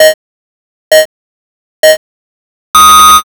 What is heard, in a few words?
countdown